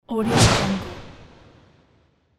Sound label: space ship passing; medusamusiclab